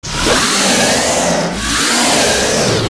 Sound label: Ganon Die